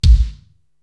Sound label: BD Mondo Kill, Korg TR-Rack